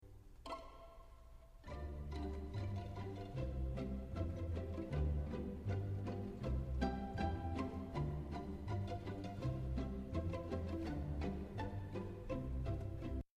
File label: pianissimo example